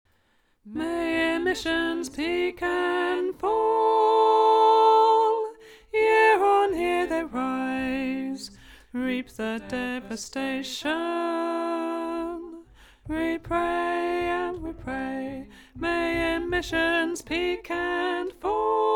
Alto

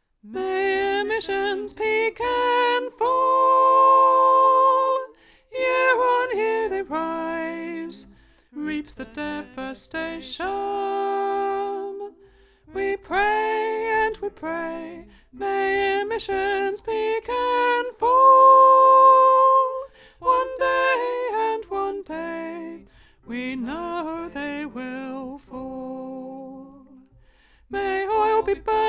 Soprano

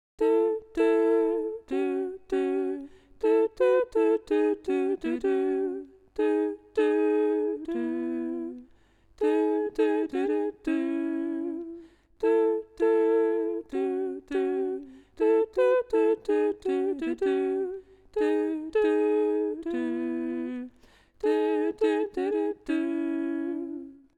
soprano

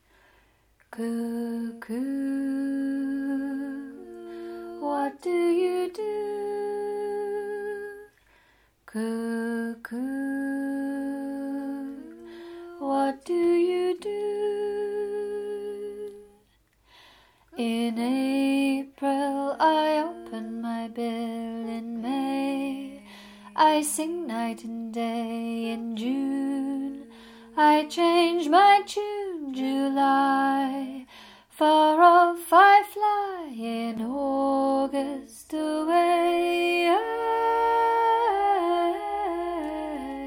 cuckoo mid tune; Alto

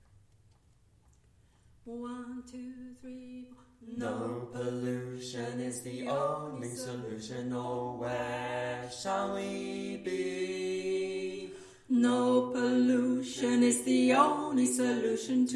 TENOR

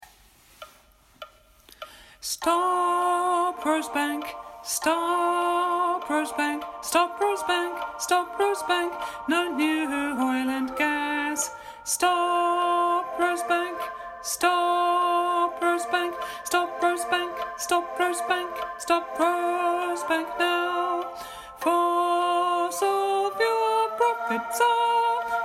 ALTO

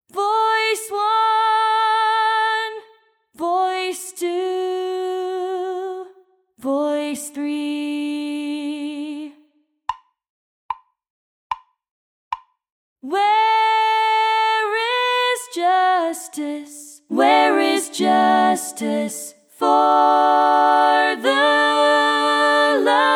all voices

